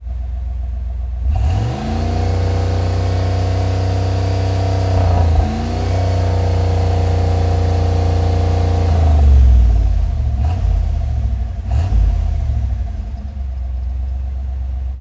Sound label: 91 Firebird Fast Idle, Automobiles